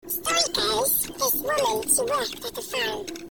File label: pitch